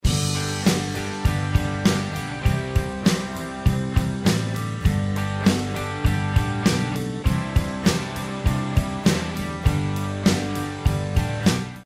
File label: jam track, rhythm guitar; rhythm guitar mastery